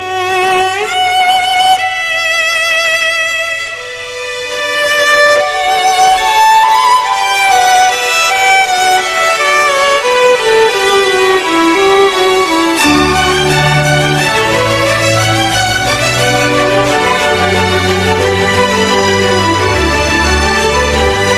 Extraordinary Violin

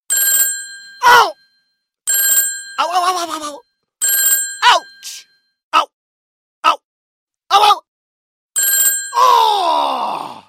Old Telephone Remix

